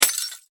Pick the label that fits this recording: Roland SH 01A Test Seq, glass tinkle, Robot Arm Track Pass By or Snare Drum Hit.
glass tinkle